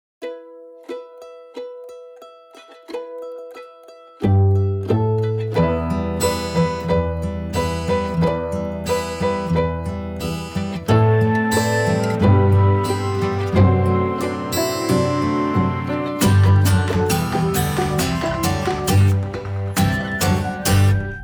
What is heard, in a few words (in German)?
Windraedli Playback